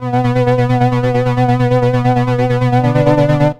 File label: 2 Step 133 SYN, House